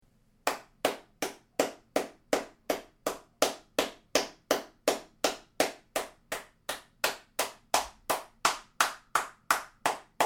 Applause Single Person Clapping; One person clapping, moderately slow; Applause Sound Effects

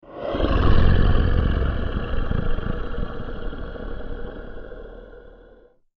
Horror Monster Growl Large

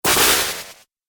Laser Energy Charge; Laser Gun Sound Effects

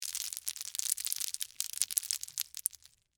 Debris Crackers, Oyster; Texture; Debris Sound Effects; Materials

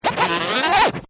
Insane Sax; Horns; Sound Effects:Musical

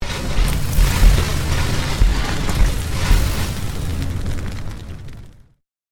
Created Magic Spell Meteor Shower; Series of created spell sounds

